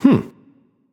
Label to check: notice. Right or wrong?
Right